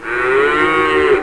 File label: CALF MOO; ANIMALS